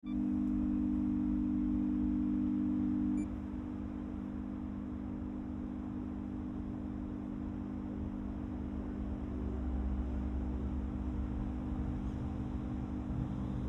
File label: Narrowband Alternate Binaural Beep; ANC